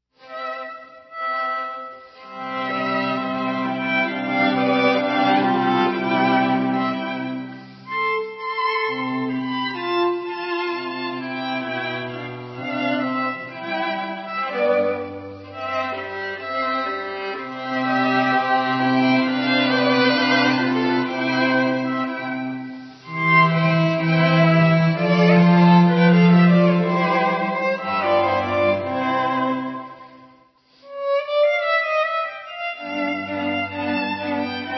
String Quartet Eine Kleine Nachtmusik; Mozart

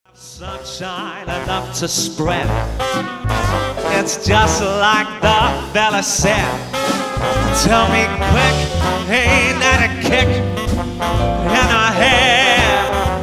Swing Band Live

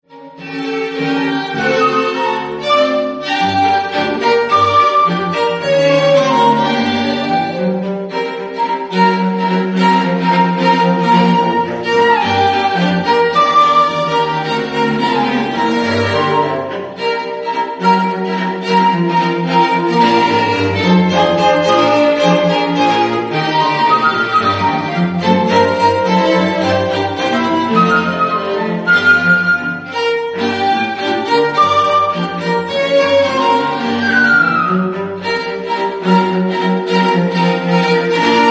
swing; The Light Ensemble

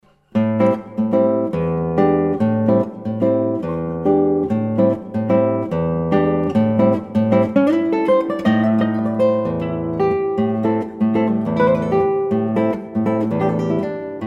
Solo Guitar

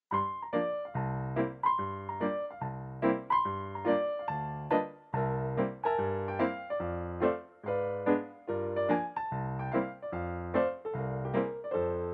Piano Vocalist

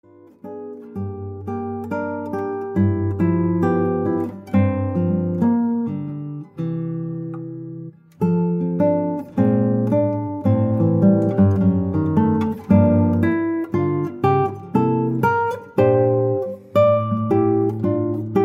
Solo Guitar

